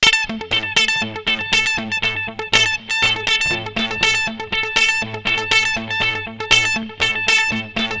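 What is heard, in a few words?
guitars 120, Adrennalinn 2fers; Zero-G Kocktail Kollection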